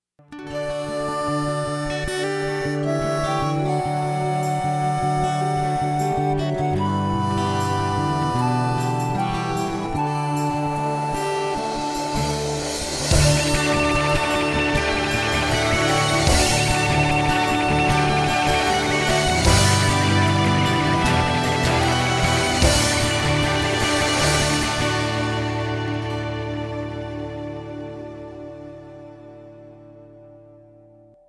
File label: guitar harmonica